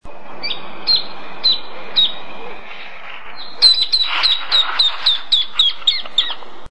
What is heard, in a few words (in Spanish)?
voz espinero pecho manchado